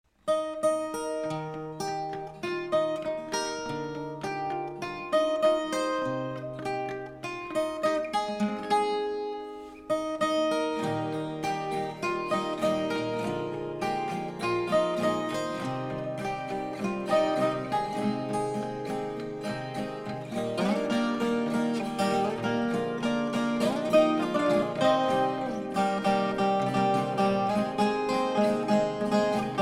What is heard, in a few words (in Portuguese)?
viola instrumenta